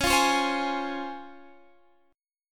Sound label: C sharp Major 7th C sharp Guitar DADGAD